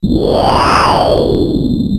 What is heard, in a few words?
micromoog